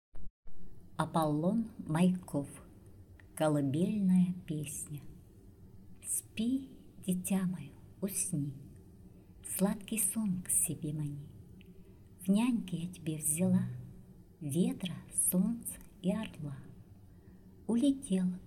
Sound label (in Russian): Колыбельная; poems for children